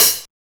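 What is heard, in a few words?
hi hat; E-Lab; X Static Goldmine 1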